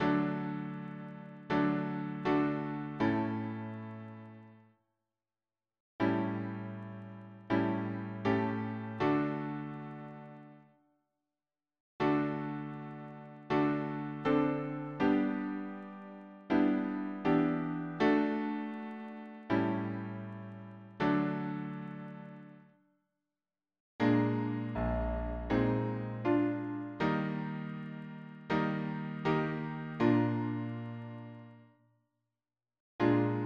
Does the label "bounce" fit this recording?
No